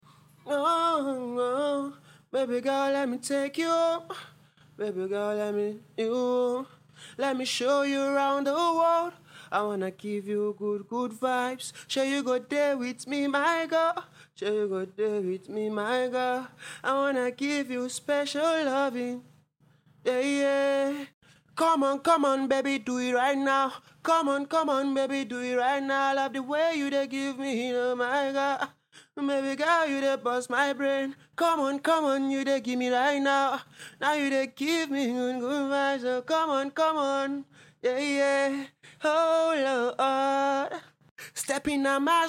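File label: pop rnb, 98, E major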